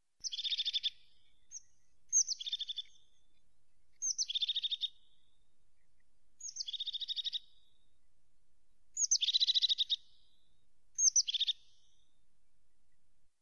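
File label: crested tit voice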